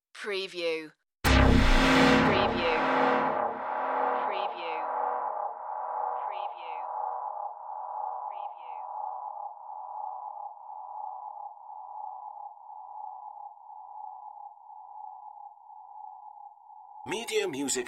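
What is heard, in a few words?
Dark Impact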